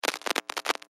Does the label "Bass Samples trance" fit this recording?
No